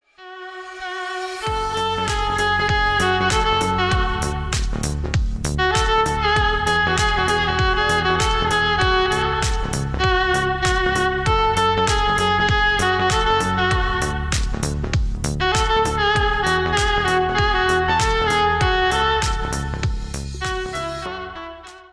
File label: violin cover